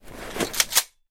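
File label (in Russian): щелчок быстрой перезарядки пистолета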